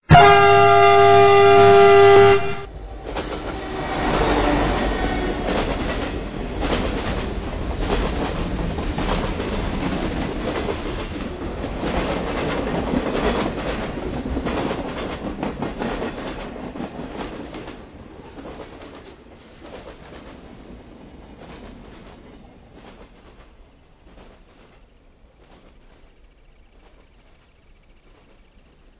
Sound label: Train passing by